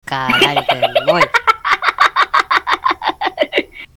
risada otaku

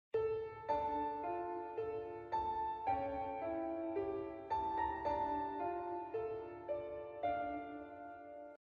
musica triste

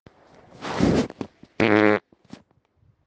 peido

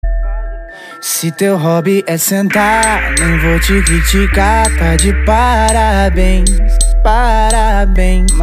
parabens funk

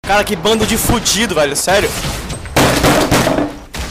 bate na mesa; pardal; Bando de fudido

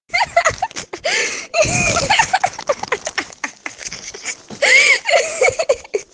risada

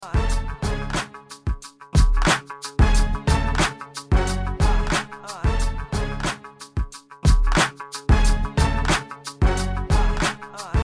batida

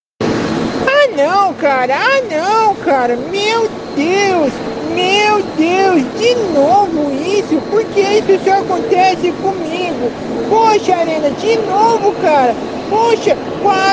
choro

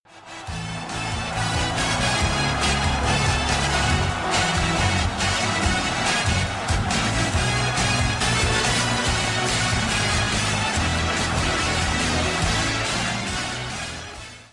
hino instrumento; botafogo

